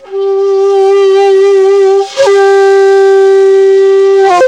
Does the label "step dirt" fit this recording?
No